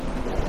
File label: lake waves